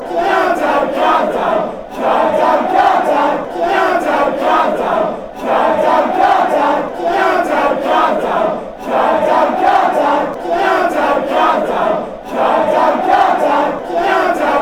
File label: fanchants; calm down calm down